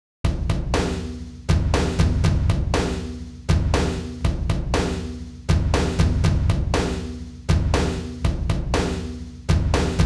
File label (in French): batterie